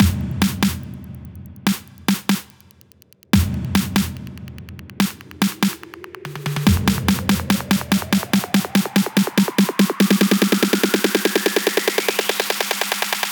Build Up Loop 144 Snare Rising

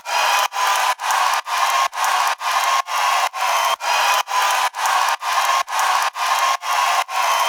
Crowd Fx, 128 BPM

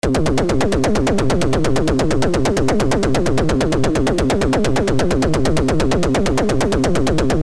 Stab Rude

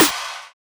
Snare; Hostile

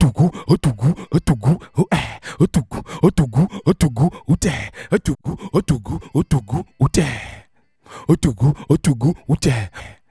vocal

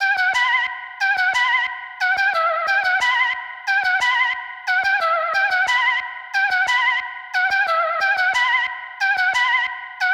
Melody High, 90bpm, E, Wet

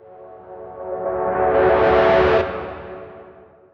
synth meteor shower F-

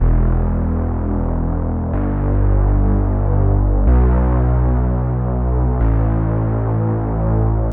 Bass Intro, 124, E

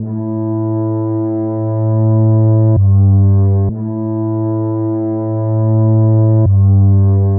130, Am, BASSLOOP

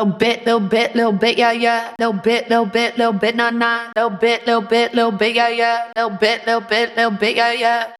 120 vocal loop wet Cmin; lil bit

